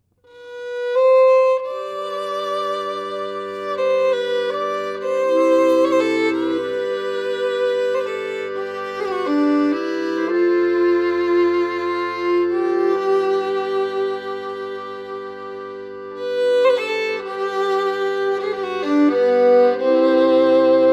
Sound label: Irish lament